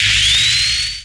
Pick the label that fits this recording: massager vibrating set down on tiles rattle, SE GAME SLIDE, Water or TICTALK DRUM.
SE GAME SLIDE